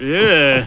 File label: eurh